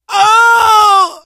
hurt major, survivor voice, manager; source engine; left 4 dead 2

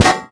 metal solid impact soft

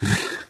ballbot short impatient

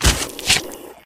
grub squish, antlion grub

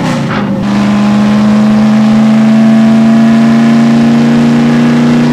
jnk third, vehicles, junker; Portal 2; Source Engine; video games

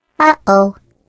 turret pickup; source engine; portal; turret floor

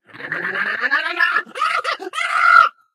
ballbot jump short

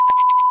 space critical stop; windows 9x; sound schemes

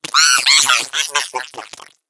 laugh, eggbot

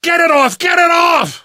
scream while pounced, survivor voice; source engine; left 4 dead 2; namvet

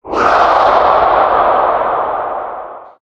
male scream, halloween; Source Engine; tf

